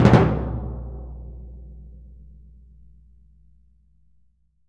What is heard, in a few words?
TMP C#, Synclavier Percussion